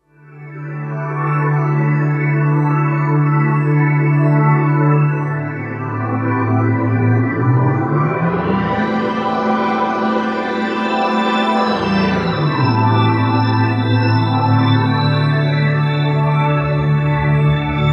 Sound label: Kawai K5000S; Seaworld